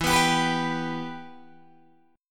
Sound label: E Minor E, Guitar, DADGAD; down